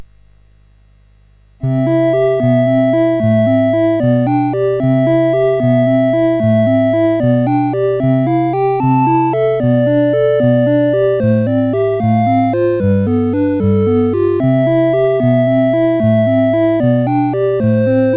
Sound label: Valsette, CSG8580; Syl